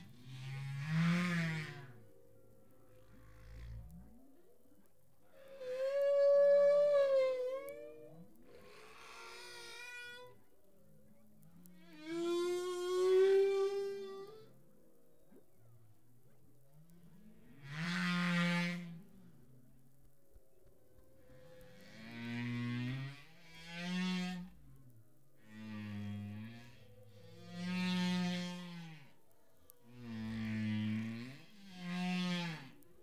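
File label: humpback whale song; sanctsound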